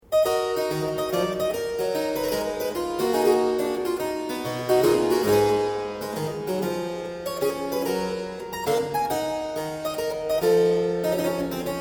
Suite in E Minor - Corant, Keyboard Music; Colin Booth; William Croft

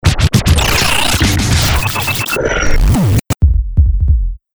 Squaller, Stabs & Bursts; Best Of N2O Library

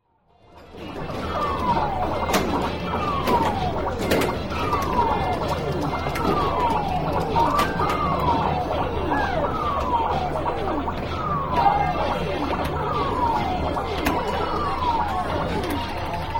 Video Game Arcade; SOUND EFFECTS1

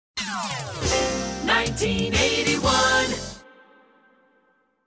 Hits, Drops

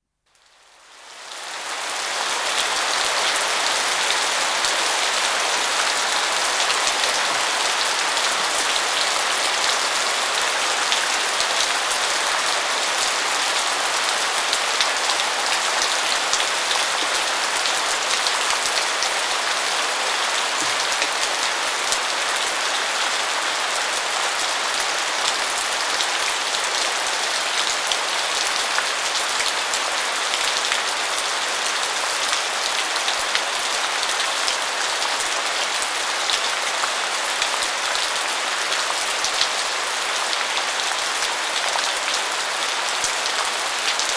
Heavy Downpour In the City; Dr. Sound Effects; 210 Nature Sounds - 20 Hours of Relaxing Natural Ambiences for Meditation and Sleep

